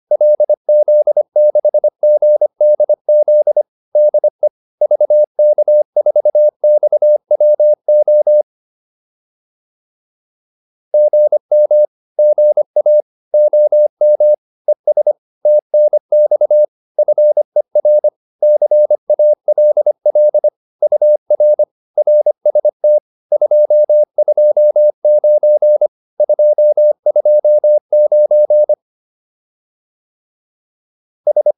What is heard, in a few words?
QSO, intermediate practice files